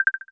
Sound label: Clear, Samsung SGH-i600